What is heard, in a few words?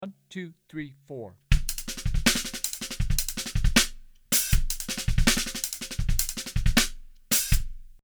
Digital Delay; Vinnie Cs